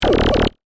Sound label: Zap Rapid Filter, Scifi, Science, Fiction; Sci Fi Sound Effects; BlastWave